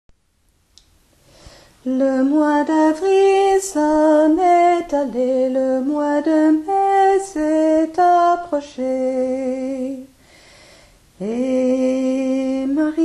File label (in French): tenor marions les roses